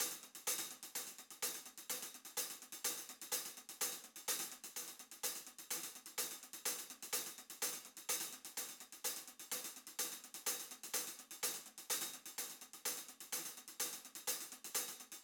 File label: hihat; unsighted; Gym hihat